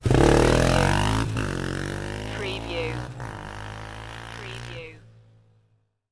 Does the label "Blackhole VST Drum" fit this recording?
No